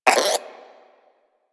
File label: Vocal Tranquilized Grunt; Warframe; Hunting Critters; Cryptilex